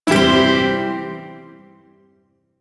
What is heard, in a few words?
Pent Maj Chord F; Warframe; Duviri Shawzin